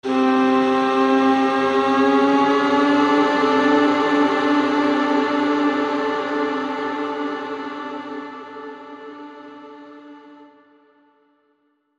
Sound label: Ethereal Bend